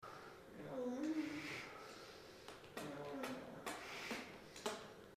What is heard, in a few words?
suck, moans; The Witcher Hunt